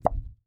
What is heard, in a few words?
Plunger Suction Slide And Pop, Household